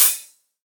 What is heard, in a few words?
small reverb open hat a key, Royality free open hi hat sample tuned to the A note.